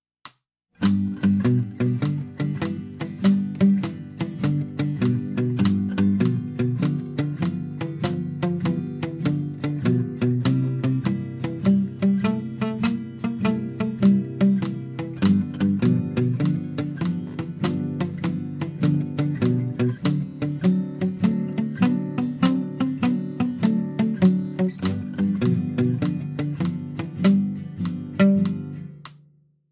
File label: Boogie Woogie, blues guitar; blues guitar methods